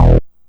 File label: Synth Hit E